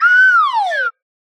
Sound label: slide whistle falling motif